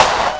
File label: PERC FX